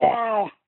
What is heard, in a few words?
baby boy dalah; nova sound